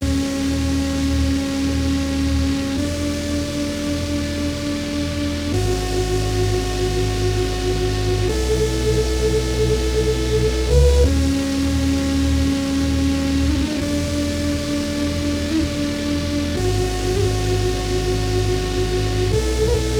Melodics; neuro; Optiv & BTK

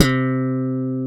A POP C2; PlatinumPhattCD1; E-MU Producer Series Vol. 8 – Platinum Phatt; Mo Phatt Instrmts

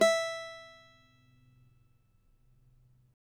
CAVAQUINHO E, Guitars; Spectrasonics; Hans Zimmer Guitars VOL-2

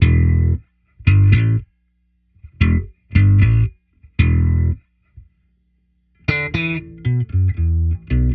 E Bass Classic Slap, 115; Zero-G; Future Wave

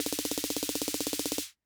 Snare Rapid Fire, rhythm king; classic machines

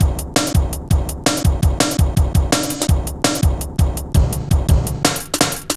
Bouncy, Jungle, Drum Loops 166.5; Zero-G Jungle Warfare 1, 2 & 3

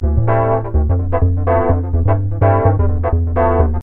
LOOP, Techno Trance; Music Loops

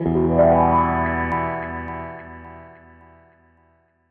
SG Guitar Loop

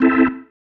Stab, Organ, One Shots

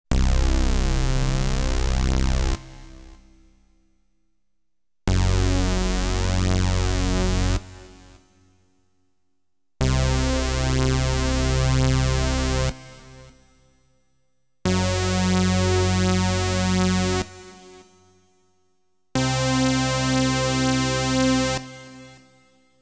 dance & techno, lead; Inspiration Zone

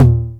909 HITS; Megabass Remix! 2

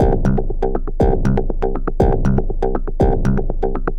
MODU C, Modular Synth Loops; Polyend; Simulacrum Sounds

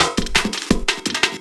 LOOP, 170 BPM; Ueberschall; Drum & Bass Flash Trackz

